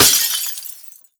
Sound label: break glass vase; physics; breaking